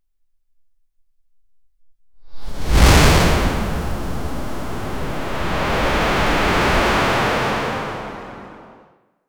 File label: sounds effect for a mana